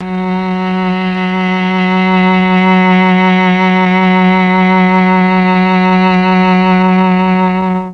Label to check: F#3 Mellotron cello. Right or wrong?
Right